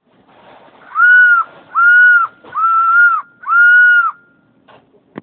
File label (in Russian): golos losya